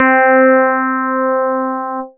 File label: Yamaha TX81Z Dynomite Electric Piano C6